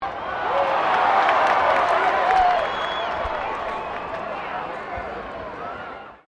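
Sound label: crowd 15K Rugby World Cup cheer medium, Rugby world cup game; Crowd Sound Effects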